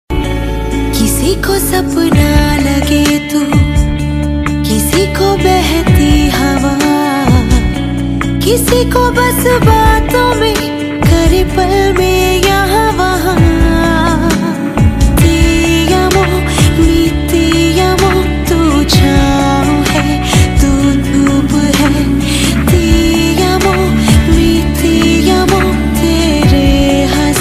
Te Amo, Female

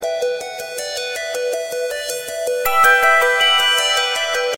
New Incoming Msg

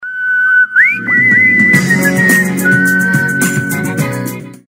Whistle, Ringtones; Suhani Si Ek Ladki; star plus

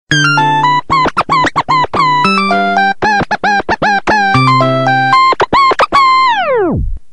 New Nokia Tune, Ringtones

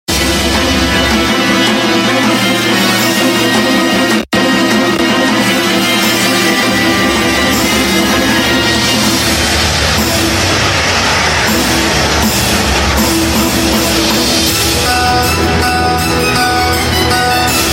Dramatic Tune; Silsila Pyaar Ka

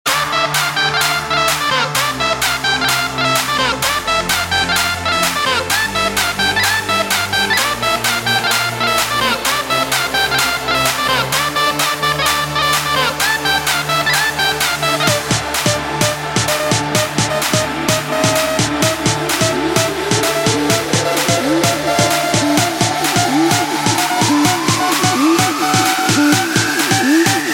Arabian Flute Mix

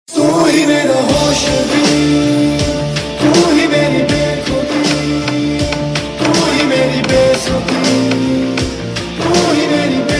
Male And Female Version; Iss Pyaar Ko Kya Naam Doon - Ek Baar Phir; Star Plus

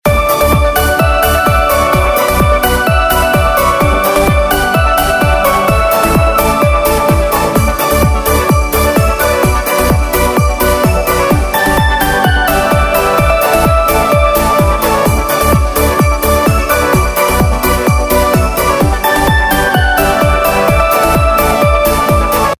Instrumental Ringtone; Bollywood Hits (2015)

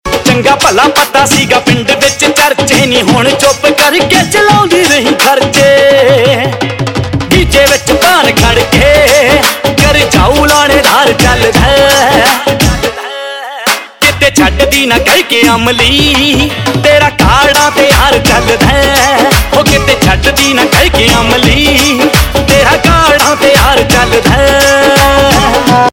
Ringtone, Punjabi

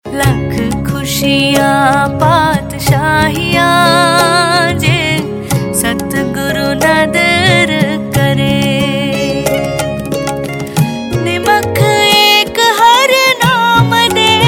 Ringtone, Punjabi

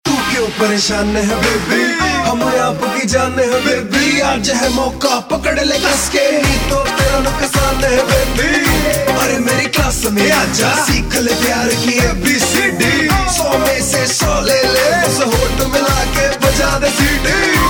Rap; Bollywood; Heropanti

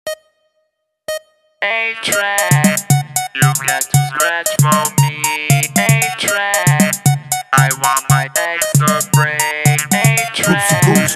Scratch A Track Ft. Metronome; Punjabi Bhangra

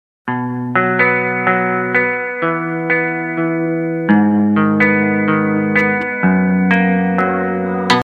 Escape Msg

